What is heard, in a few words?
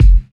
round bass drum one shot f key